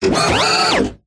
science fiction robot large mechanical movement

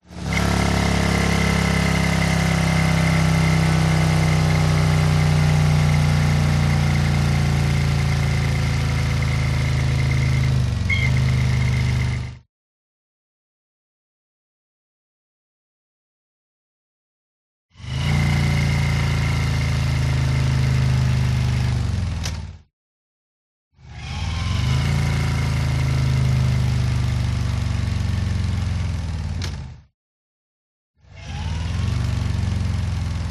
MOTORCYCLE Harley Decelerate; TRANSPORTATION; smartsound

